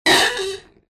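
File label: strange squeak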